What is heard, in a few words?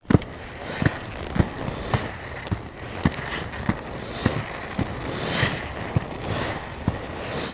Rollerblade Skate; Blastwave FX